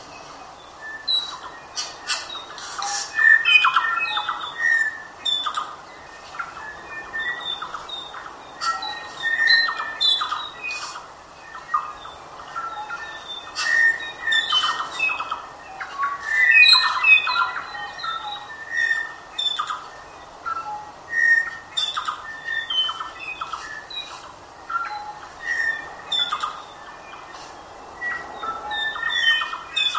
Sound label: korimako bellbirds